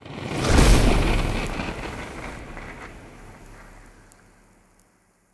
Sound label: ship corvette move; Stellaris; toxoids; ships